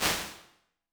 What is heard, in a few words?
Large Room, Lexicon 480L Reverb, impulse responses; Big Gee's